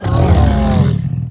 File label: Clumsy Kodo Attack